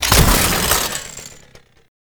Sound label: Chain Saw Tonfa Stack Buff Drop; Warframe; Grineer